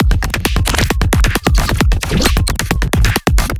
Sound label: super drum, percussion and foley